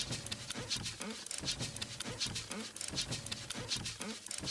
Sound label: Toplist Ambience; Candy Crush Soda